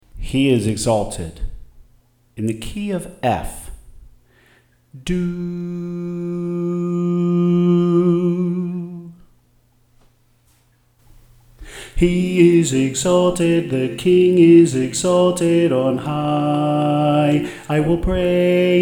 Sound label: Soprano